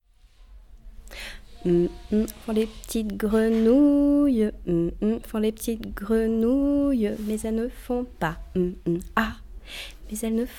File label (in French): Les grenouilles